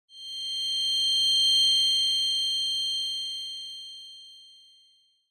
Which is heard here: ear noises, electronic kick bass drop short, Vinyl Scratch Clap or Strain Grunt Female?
ear noises